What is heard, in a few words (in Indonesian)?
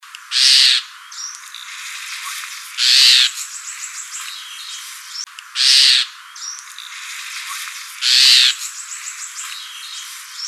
jalak kapas call